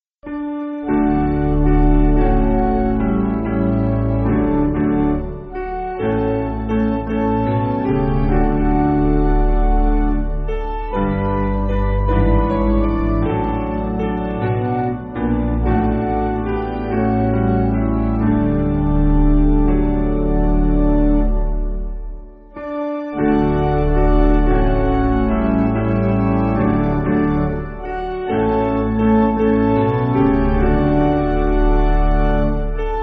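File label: Piano, Music Backing; Lord Lay Some Soul; Ira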